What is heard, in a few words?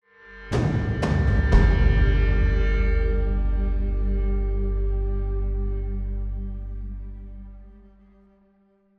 Tension; AB Tension 3 Match Over